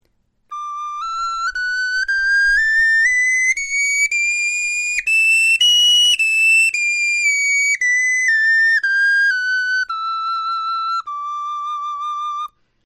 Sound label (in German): tonleiter